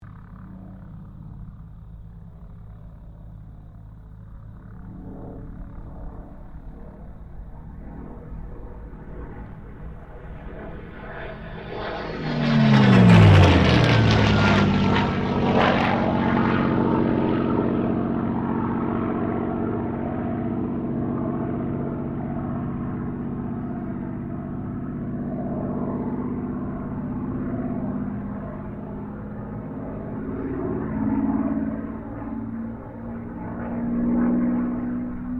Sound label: AIRCRAFT SUPERMARINE SPITFIRE MK-XVI LOOP; Airplanes